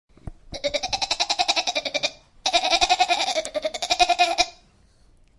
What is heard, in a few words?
goat